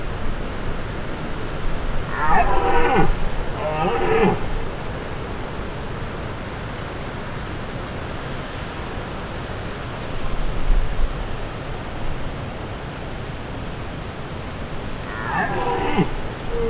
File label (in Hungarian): óriás hangmorzsa bőgő bika, gímszarvas